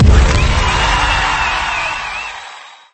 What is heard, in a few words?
mega dunk; nickelodeon basketball stars 2015